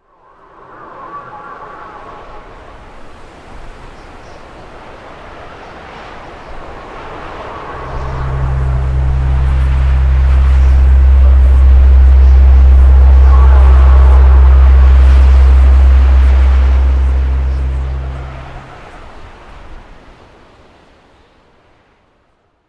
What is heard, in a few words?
buildup